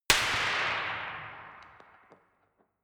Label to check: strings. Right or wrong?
Wrong